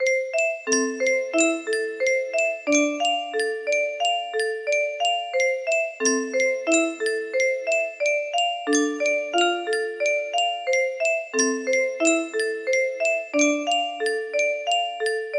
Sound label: music box; Coraline